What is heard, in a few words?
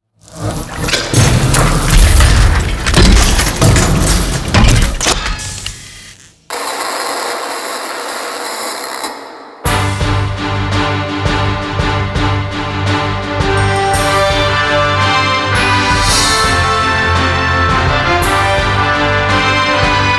music Sound Stadium